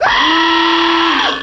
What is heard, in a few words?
yelp